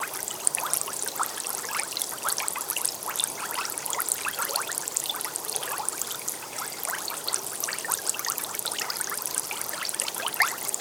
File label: Bubbles Bubbling Co